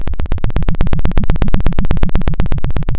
Sound of a helicopter

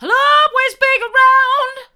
i'll allways be around hard, vocal

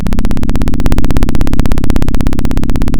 Sound of a plane taking off

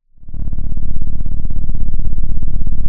Sound of an engine revving

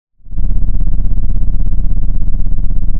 pop, Sound of an engine revving

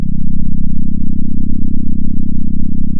center, sound of a small motorcycle

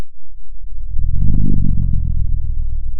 pop, Sound of a dinosaur

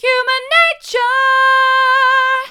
human nature hard, vocal

